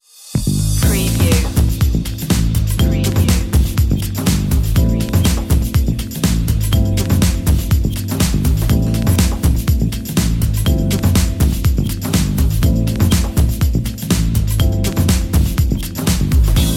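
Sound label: underscore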